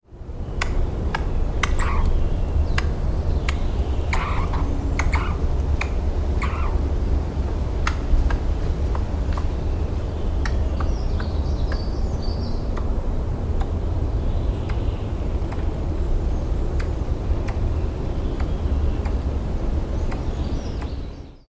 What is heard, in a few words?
Sciurus vulgaris